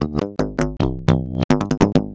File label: TUBES; Rarefaction - Poke in The Ear With A Sharp Stick